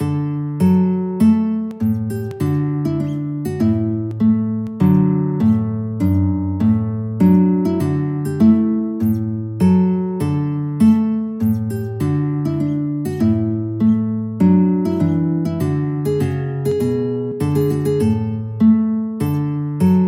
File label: guitar